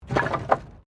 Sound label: Wood Move